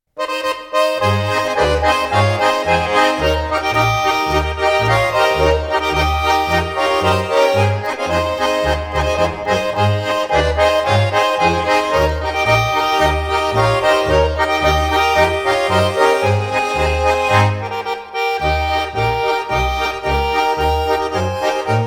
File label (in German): Marsch